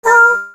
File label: hurt